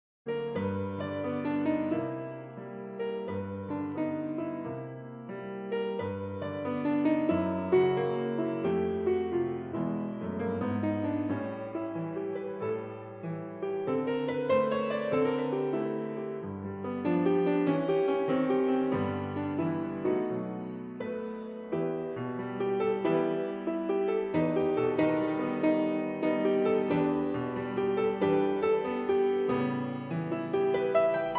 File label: Blues, Grand Piano